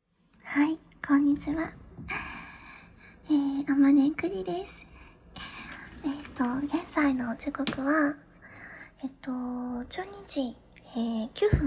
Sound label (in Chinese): 模拟验尿场景将小便尿进纸杯 轻度降噪